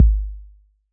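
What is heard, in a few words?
bass drum 808 Decay C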